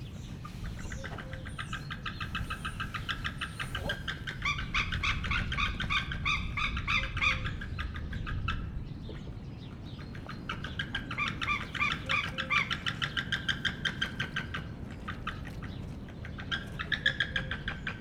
hosszasan szeles idő, rétisas; Hortobágyi Nemzeti Park

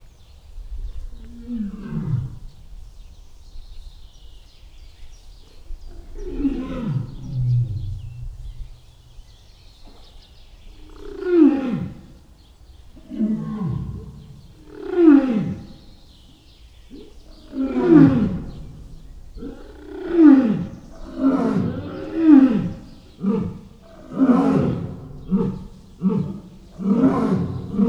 bombolés madarcsicsergés mellett, oroszlan; marantz puska; debreceni zoo 2012

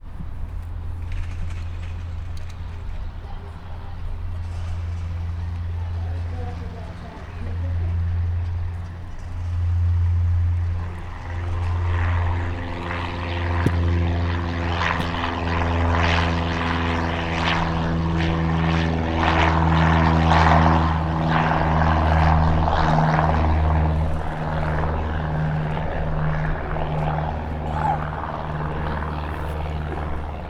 jobbrol elszallo, egymotoros repulo, repter; budaorsi repter

